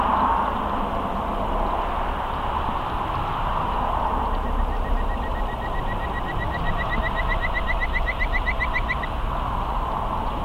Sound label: frog, Kajika frog